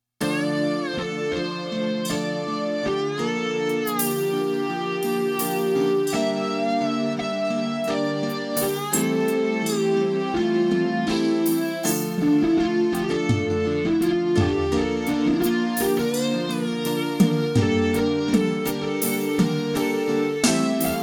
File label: flanger